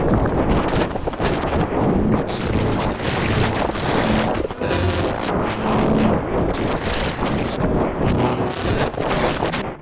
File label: messy granular thing